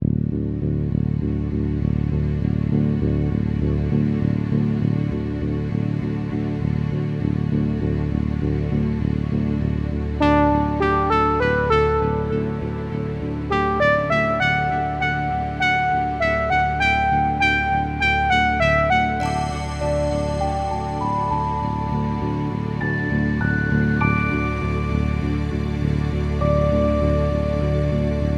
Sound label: victory